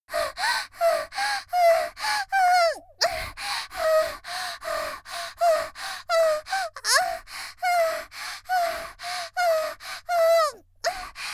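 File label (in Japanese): 少女「息」絶頂後の呼吸; 元気な少女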